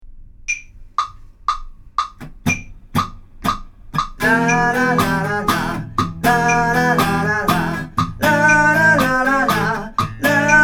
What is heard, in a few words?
vocal